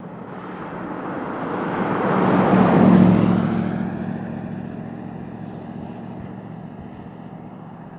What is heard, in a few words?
Buick Fst By